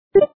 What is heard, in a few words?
Windows Information Bar; windows doors windows parody online gamerip 2009